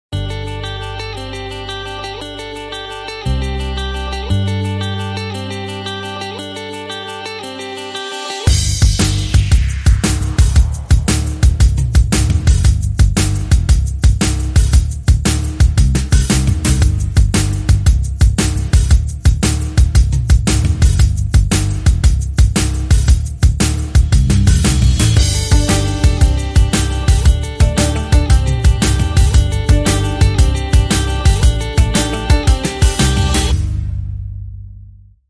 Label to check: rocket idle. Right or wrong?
Wrong